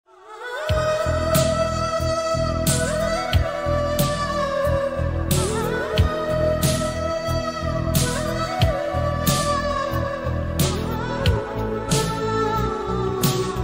humming ringtone

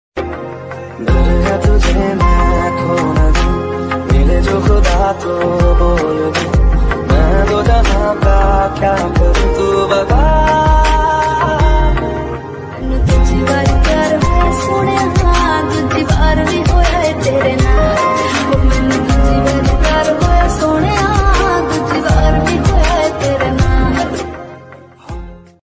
romantic love ringtone

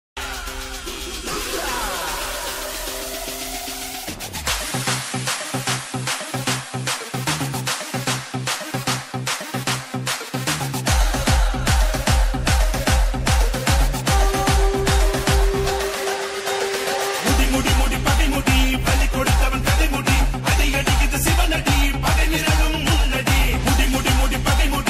song ringtone bgm; rudhran; raghava lawrence

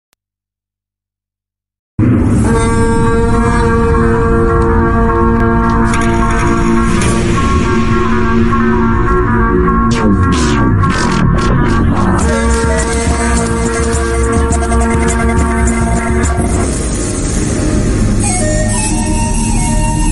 villain bgm mass bgm; sarrainodu

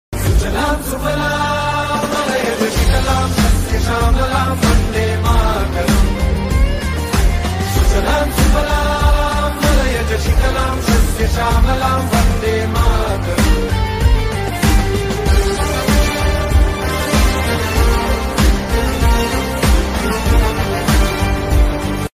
vande mataram bgm ringtone; fighter movie

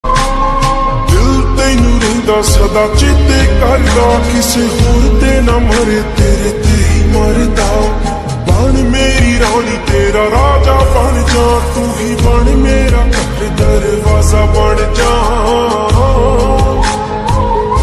ringtone slowed reverb

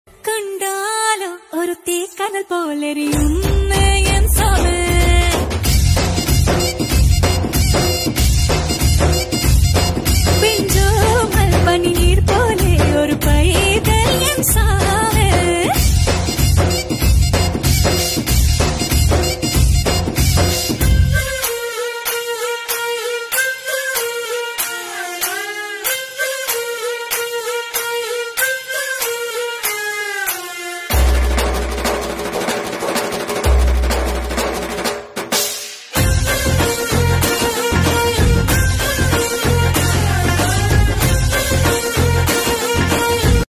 romantic ringtone; pushpa 2

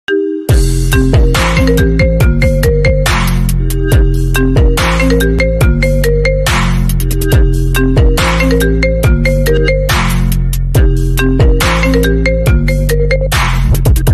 iphone 14 pro max ringtone apple ringtone

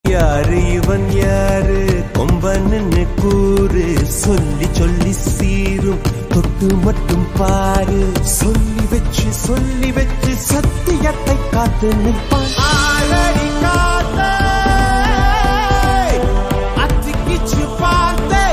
mass bgm music

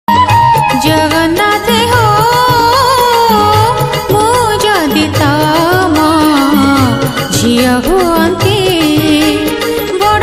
jagannath bhajan

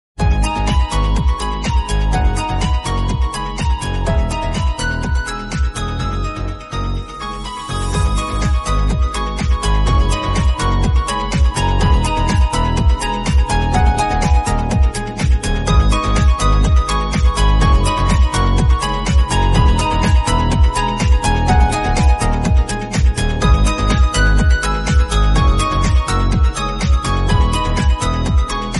ringtone instrumental

